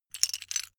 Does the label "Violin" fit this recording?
No